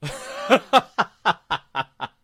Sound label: male laugh; Voices Emotes